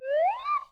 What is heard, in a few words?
Special Photo Birthday Card Balloon Rise